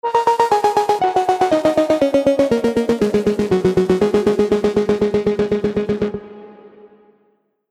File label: Monoseq Modwheel; Preset: JS Monoseq Modwheel; Generate; Newfangled Audio